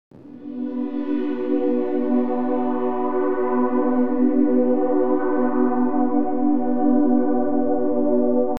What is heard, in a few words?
PAD